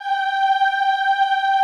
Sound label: OOH G4, Classical Choir; Best Service; Extended Classical Choir; OOH MIX